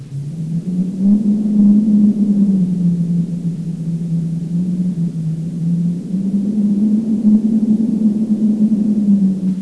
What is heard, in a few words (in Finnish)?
tuuli; suomenkielistä